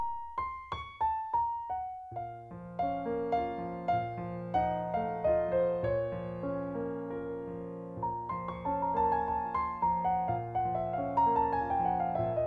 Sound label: Chopin Nocture in Bb Minor